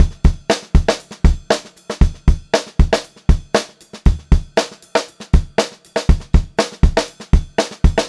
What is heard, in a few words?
Pop-Rock Drumloops 118 POP VARI; USB Soundscan vol.37; AKAI